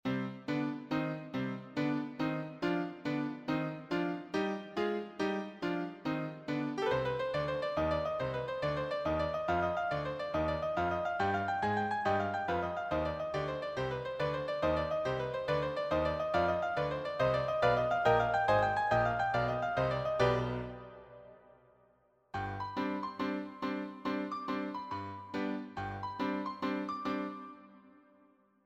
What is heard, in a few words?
accompaniment, piano